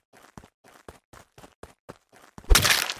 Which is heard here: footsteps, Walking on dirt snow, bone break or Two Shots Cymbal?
Walking on dirt snow